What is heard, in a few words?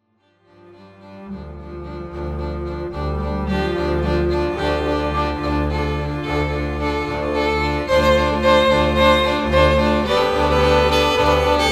strings and trumpet